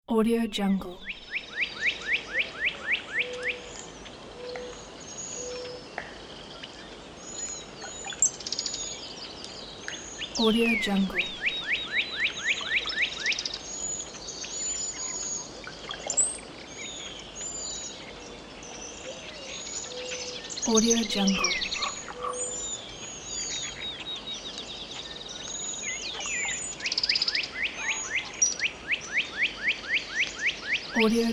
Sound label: chirping birds